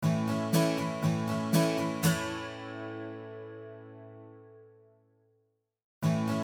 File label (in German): Gitarre Chorus